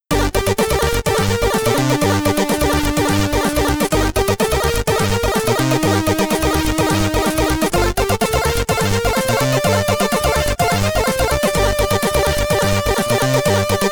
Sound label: Boss Theme, SID Stereo, Commodore 64; Parsec